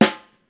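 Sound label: SNARE, Steel Percussion; E-MU Producer Series Vol. 7 – Old World Instruments; World Combos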